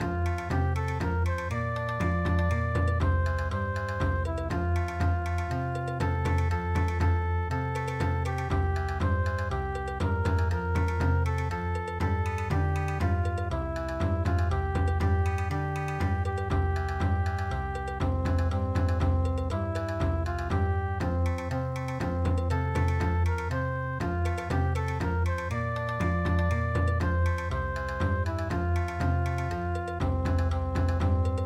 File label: Bon Odori, midi